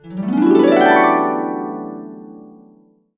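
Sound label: D major up, harps; Complete Harps; USB Soundscan vol.62; AKAI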